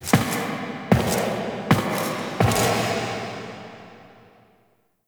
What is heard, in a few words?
Foley Steps Intro; Orbiter Nursery; Operator; Tenno; Cinematics